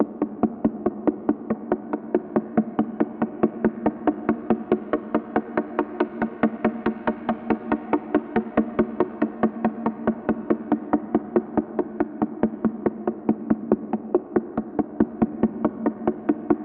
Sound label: prison track synth